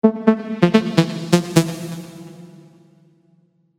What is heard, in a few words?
Synth Fill A (128 BPM)